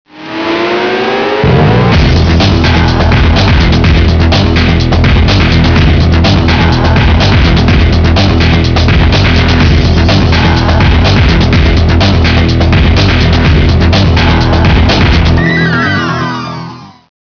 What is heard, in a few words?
Electronica Recycle; 250 Desktop Themes